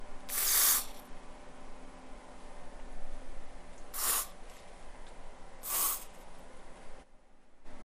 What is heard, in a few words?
Aerosol Spray Short Household